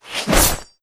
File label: melee slide; custom weapon; k31; octane